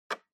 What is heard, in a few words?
Clock Minute Hand Dry